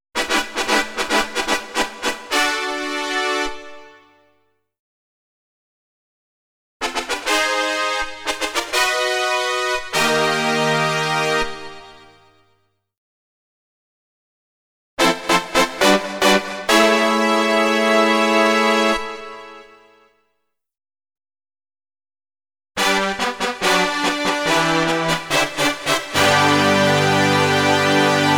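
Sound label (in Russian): вступительные фанфары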